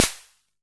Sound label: SD A; Olson XX-100